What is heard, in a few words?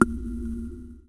Snare, Korg Z1